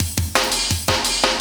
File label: loop, Drum & Bass, 170 BPM